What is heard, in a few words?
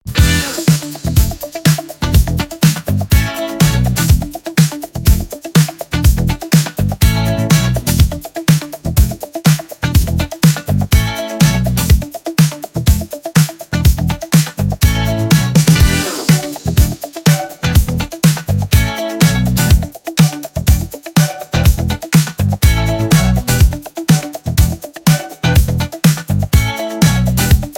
coming closer 123bpm, Disco Fever